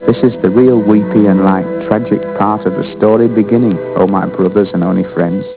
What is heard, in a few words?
weepy